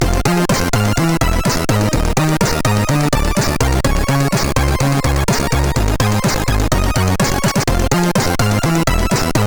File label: Test, CSG8580; hvsc